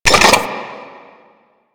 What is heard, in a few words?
flying robot engine start; Warframe; Veilbreaker; Quests; Ambience; Kahl